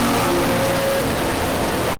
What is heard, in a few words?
jumpscare sound